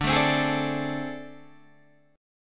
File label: A Minor Major 7th Double Flat 5th D, chord